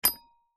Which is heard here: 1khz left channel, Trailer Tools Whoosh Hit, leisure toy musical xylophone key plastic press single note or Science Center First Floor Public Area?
leisure toy musical xylophone key plastic press single note